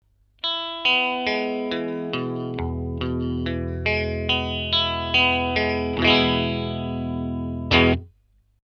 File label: jag 1963 both strangle